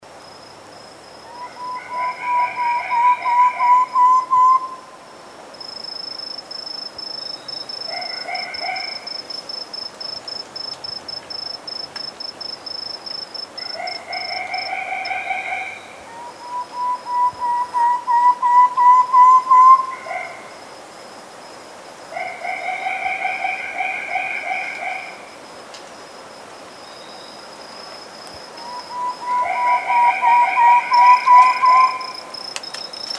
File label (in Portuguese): canto do torom de peito pardo